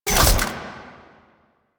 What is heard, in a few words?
Door Medium Close; Ambience; Warframe; The New War; Quests; Zariman